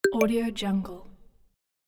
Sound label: message notification alert